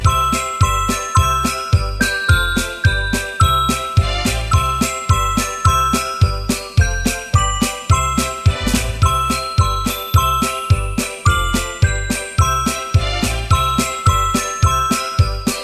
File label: splash Loop, music; spongebob squarepants merry mayhem